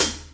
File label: Hat Edge Closed; hihat; drum hits; Ableton; Factory Packs; Drumdrops Recording Hybrid Kit